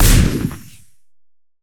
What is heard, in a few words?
Sci-Fi Weapons-Assault Rifle-Single Shot